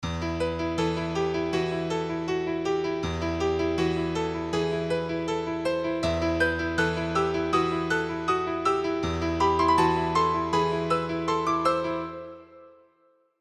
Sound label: Piano; Seisouso